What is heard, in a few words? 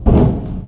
duct footsteps, infected walk; player; source engine; left 4 dead 2